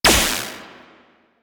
Laser Skin Printer Laser Start; Props; Warframe; Entrati

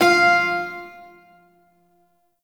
Shawzin Oct Two F; Warframe; Duviri Shawzin

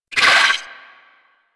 Amalgam Moa Satyr Vocal Pain; Warframe

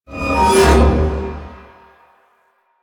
Weakpoint React Screech; Enemies; Lasrian Tank; Warframe

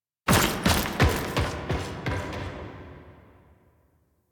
Forest Thumper Exit Foley; Warframe; Veilbreaker; Kahl

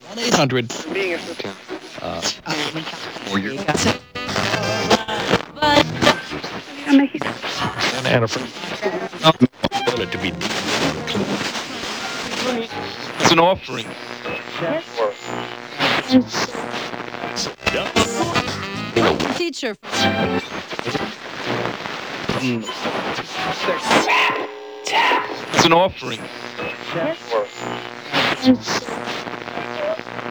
Boom Box Loop; Ambience; Props; Hub; Warframe; NineteenNinetyNine

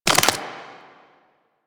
Proto Infested Maggot Step; Warframe

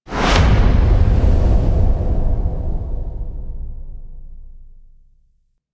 End Stinger; Warframe; Veilbreaker; Devourer Tenno Start

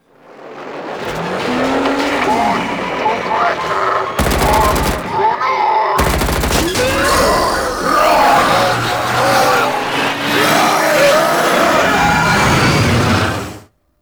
Ship Engines; Quests; The New War; Grineer Eidolon

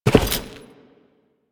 Step Default, Footsteps

